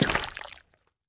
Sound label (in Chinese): 蜂蜜块 踩踏声